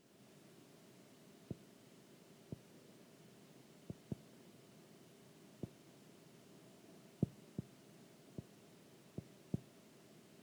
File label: Subwoofer